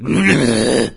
zombie sight; doom3; fatzombie

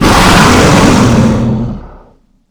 dragon shout long

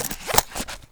holster out, weapons firearms

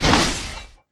armor

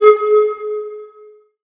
boop, ui

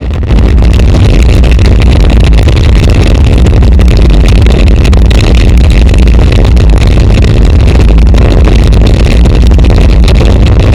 rocket fire loop; bms objects

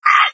pain, hazmat zombie; doom3

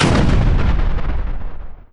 explode, revanent; doom3

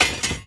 wep ground

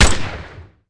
rifle assault g3

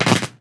new spark; weapons; gamma

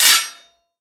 pullout

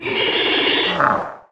facehugger threat

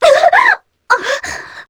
succubus hurt strong; queen girl

